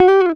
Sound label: GTR TRIK, Jazzistic; Univers Sons